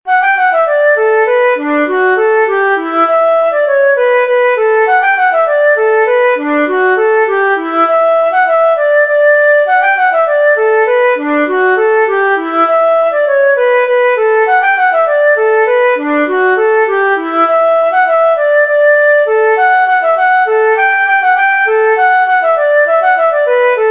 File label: polka set